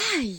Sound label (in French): melodie; code game jam drop plafond 2025